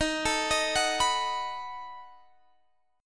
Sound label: B 7th Eb